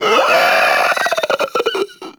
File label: Infested Runner Death; Warframe; Runners